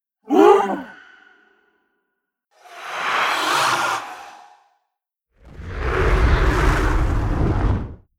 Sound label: Fire Screams Gasp